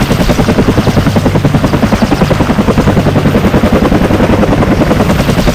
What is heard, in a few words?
cobra idle loop; Call of Duty Modern Warfare